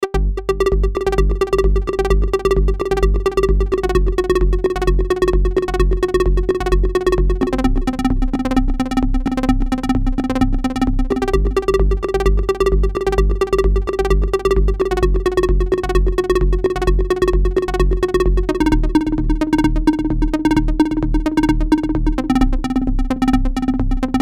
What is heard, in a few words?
Buchla Easel V Nice Lead Sequence; Arturia